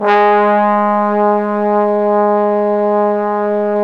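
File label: Bone Sec, trombone section; Roland L-CD702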